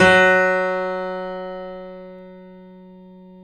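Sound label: piano, F#, right; InVision Interactive - The Piano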